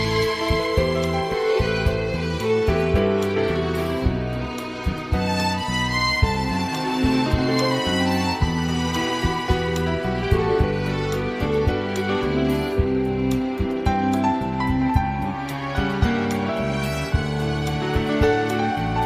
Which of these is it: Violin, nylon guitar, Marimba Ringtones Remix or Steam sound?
Violin